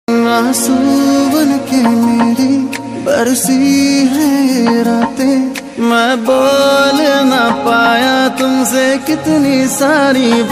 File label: ringtone